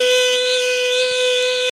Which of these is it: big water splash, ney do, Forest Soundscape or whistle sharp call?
ney do